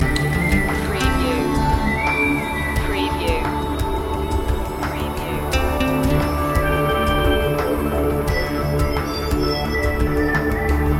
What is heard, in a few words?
rainforest loop